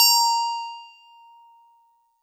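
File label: StarPiano DX100 A#5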